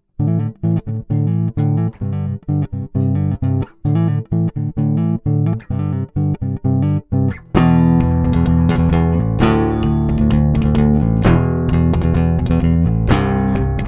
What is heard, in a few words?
Tube Tech PE1C Bass Fender Chords Fingerstyle roh